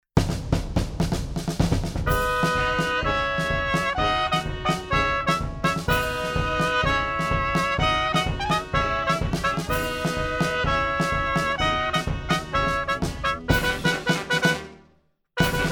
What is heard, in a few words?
Marching band Latin Finale